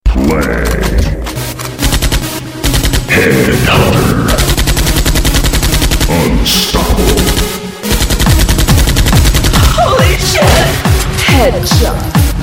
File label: techno; Counter-Strike